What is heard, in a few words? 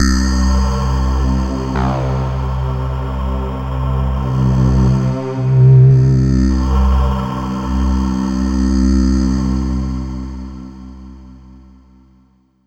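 Yamaha SY22 Arpeggio C2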